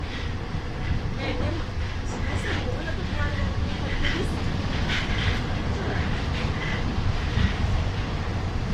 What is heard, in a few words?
acceleration, Metro